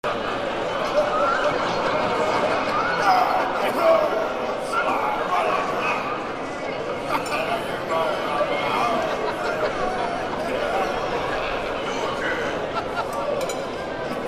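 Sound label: AMBIENCE BED Walla Crowd Banquet Hall Dinner loop; gamerip; The Unfinished Swan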